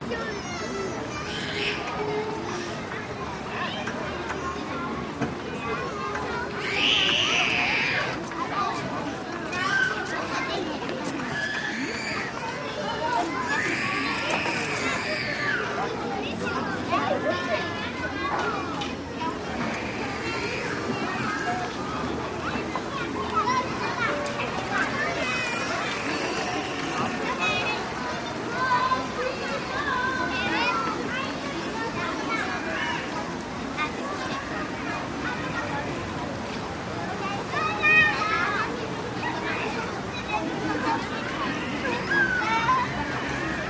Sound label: playground ambience loop; the sound pack tree